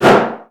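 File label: Collision Interior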